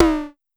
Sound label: Pollard Perc, Syndrum